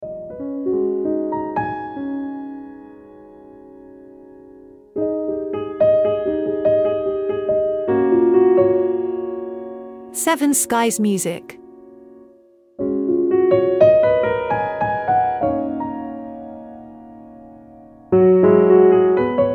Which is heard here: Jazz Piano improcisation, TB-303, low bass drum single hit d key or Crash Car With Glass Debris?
Jazz Piano improcisation